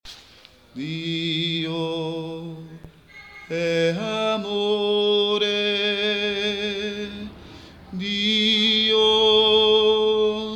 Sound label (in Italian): Dio e' amore (ten); canti; registrazioni